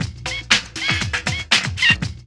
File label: LOOP, DRUM; E-Lab; X Static Goldmine 2